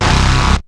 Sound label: BASS; SoundFactory; SMPMANIA